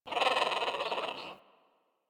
Bird Of Prey Vocal Perched Ground A; Warframe

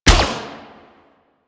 Minigun Shot

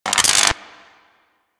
Ocean Electric Surge Small; Warframe; Grineer Ocean

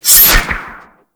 Light Glaive Throw; Warframe

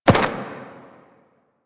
Ram Step Walk Grass; Animals; DE; Warframe; Quests; Duviri

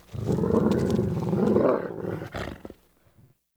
Wolf Growl Enemy Spotted; Animals; Perception

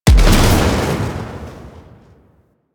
Rocket Launcher Explosion

